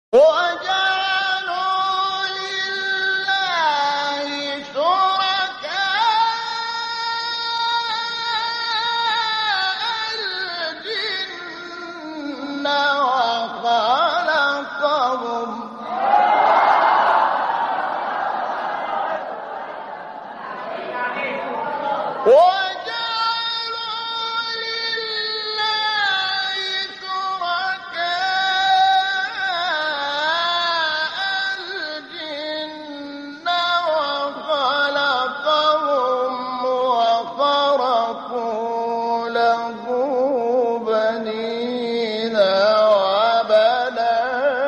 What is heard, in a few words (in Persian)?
فراز زیبای سوره; انعام; شحات محمد انور